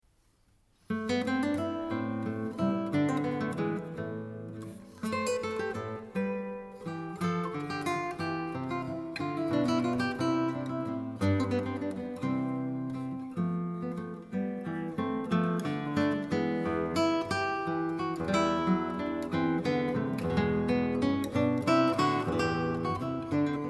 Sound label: Coranto, Tenor, Guitar